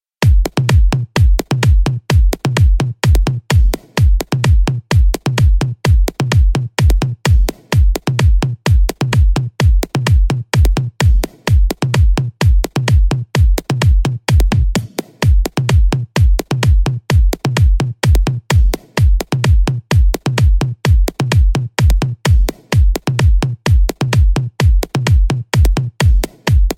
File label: Great Drum